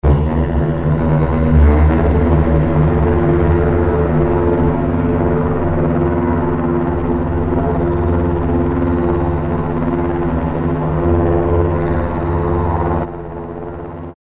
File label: didgeridoo layer